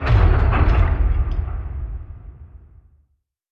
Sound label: submarines engines; World Of Warships